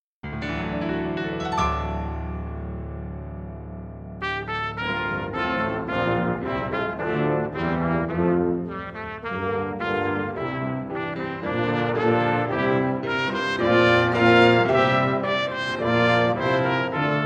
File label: Brass